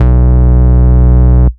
Root B, Ultimate Bass; Vengeance Samples

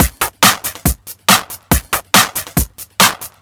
Breakbeat; Vengeance Samples; VEC2 Loops

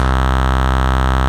Basses C; Vengeance Samples

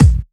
Hard Kick; Vengeance Samples

